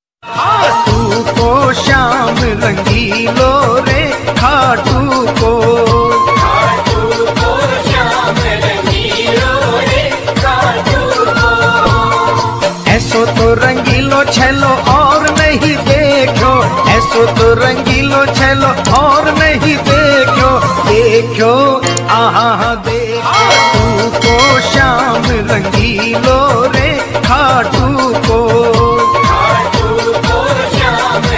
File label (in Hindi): Khatu Shyam ringtone